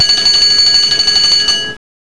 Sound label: bell, CLASS 47, Train; OpenBVE